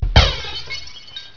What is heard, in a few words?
shatter; The Warriors of The DreamCity.; This art allows a gatekeeper to shatter a ward on a portal, therefore making it passable by dreamers without an amulet.